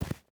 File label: footstep hide snow run; Rust